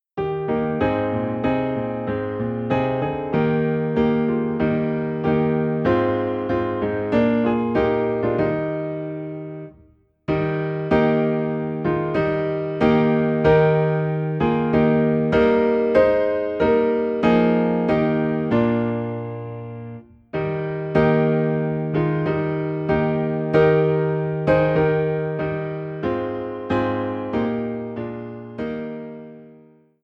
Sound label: Piano in E Flat; At the Cross